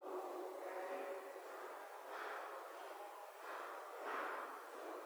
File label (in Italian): seconda parte canto